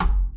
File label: acoustic bass perc; Masterbits CLIMAX 7 - Bass